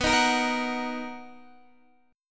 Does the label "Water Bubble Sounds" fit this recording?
No